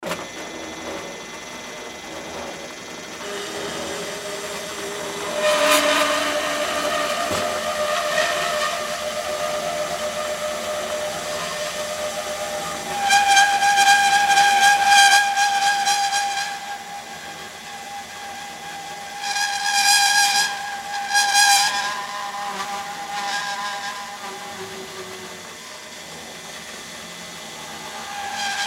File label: Band Saw Cut Metal Sheet; Saws; Industry; Blastwave